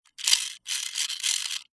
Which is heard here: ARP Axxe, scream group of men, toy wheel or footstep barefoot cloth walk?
toy wheel